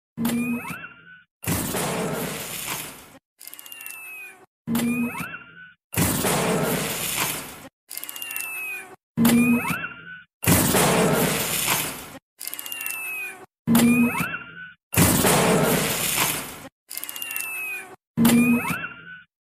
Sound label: Repulsor